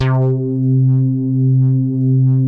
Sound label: BASS C3; Best Service Dream Experience